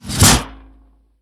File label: Pressure Plate Down